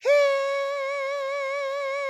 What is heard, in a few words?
roll; Heaven Studio Plus; board Meeting